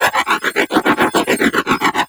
Glaive Loop; Weapons; Warframe; Tenno; Teshin Glaive

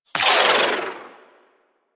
Rifle Projectile Tail; Weapons; Warframe; Corpus; Crp Ruban Rifle

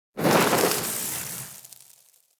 Spark Shower; ambience; props; one offs; Fortuna; Warframe; Venus Open World; Venus Solaris United

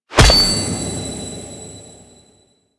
UI Socket Filled C; DE; Warframe; Fusex Treasures

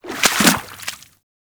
Small Land Water, Footsteps; Enemies; Warframe

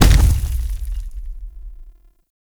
Infested Femur Step Default; Warframe

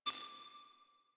Mission UI Hover; Zariman

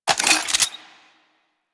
Rifle Secondary Switch; Warframe; Weapons; Wisp Rifle

